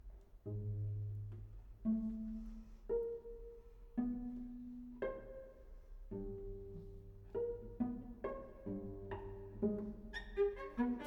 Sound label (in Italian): Sonata per violoncello solo